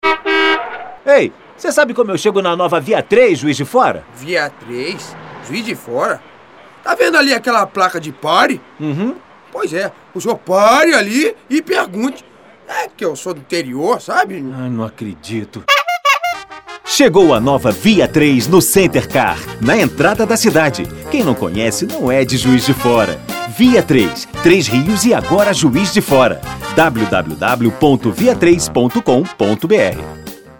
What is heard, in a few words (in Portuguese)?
caipira